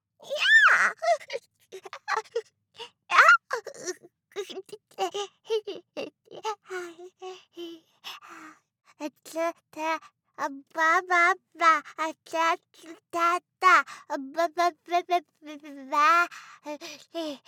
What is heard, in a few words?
spanish baby sounds